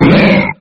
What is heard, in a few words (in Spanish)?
Grito de Grimer